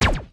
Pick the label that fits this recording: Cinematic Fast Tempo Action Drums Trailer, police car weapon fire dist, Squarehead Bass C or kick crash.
police car weapon fire dist